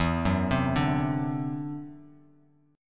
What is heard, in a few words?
E 7th Suspended 2nd Sharp 5th, E, down, Bass Standard; E7sus2#5; arpeggiated